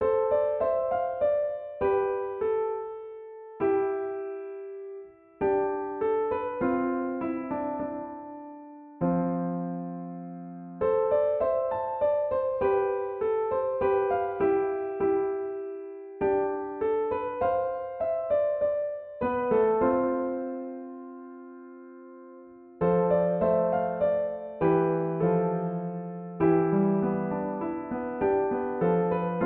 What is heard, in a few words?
Piano Music